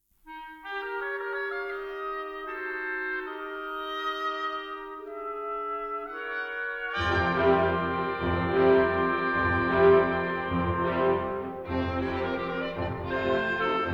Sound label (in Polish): Krakowiak; z baletu Pan Twardowski